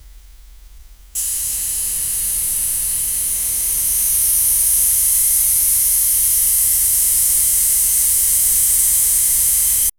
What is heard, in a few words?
steam hiss soft and cozy